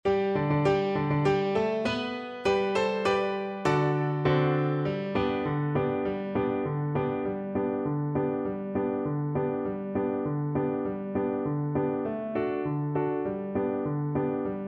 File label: Recorder